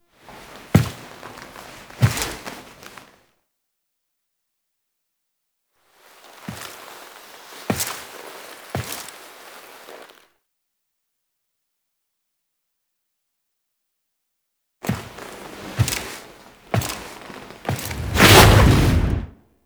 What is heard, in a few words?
Foley; Warframe; Nineteen Ninety Nine; Quests; Hive Queen